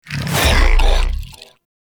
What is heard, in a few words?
Wave Cast; Warframe; Pacifist